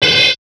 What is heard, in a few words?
Hats, Alesis Performance-Pad